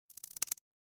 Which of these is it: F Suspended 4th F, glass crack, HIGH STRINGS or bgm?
glass crack